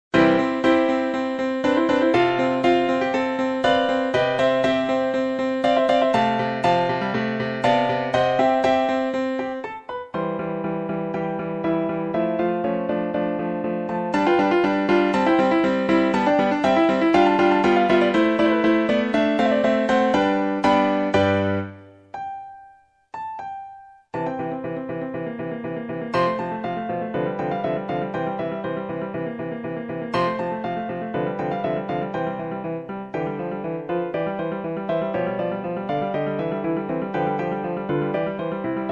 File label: ob konzert, oboe; オーボエ用伴奏; mozart